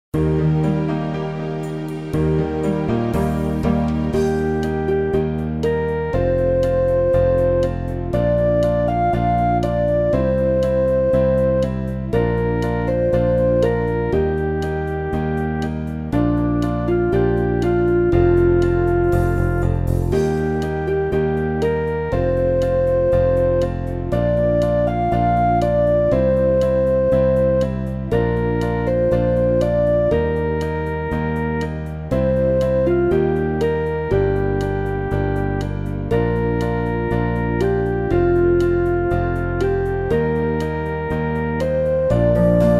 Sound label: arpeggio ballad